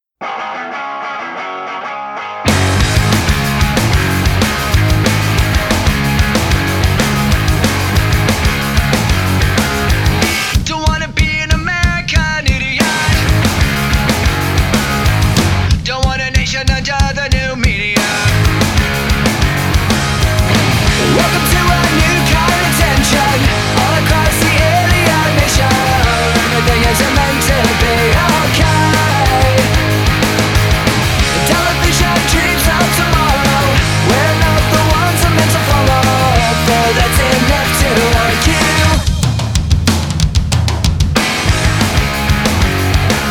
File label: JINGLE